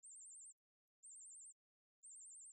hearing test warble 8000

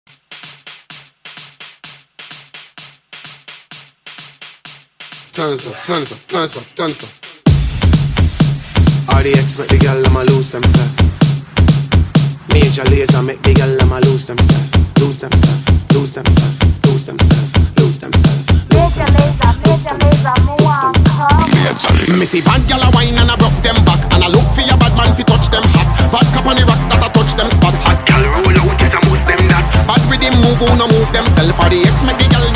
major lose

